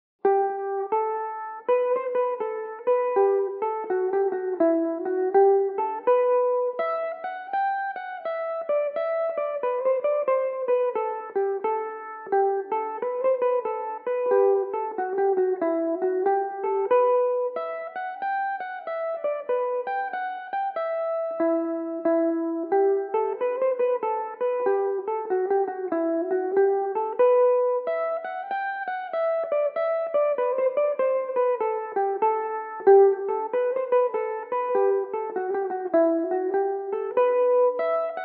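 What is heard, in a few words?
E minor, slow version, irish mandolin; rights of man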